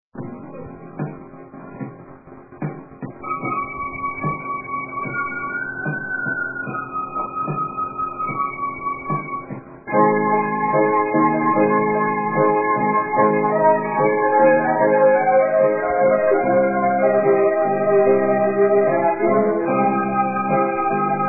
lenta teclado

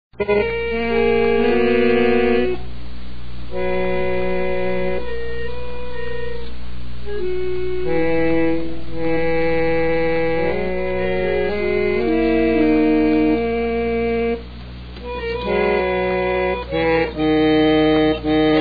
toque

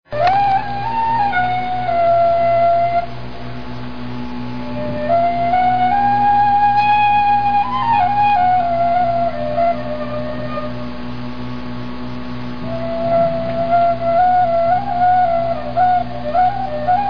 sopro

